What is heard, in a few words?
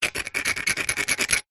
foley concrete scrape with metal sharp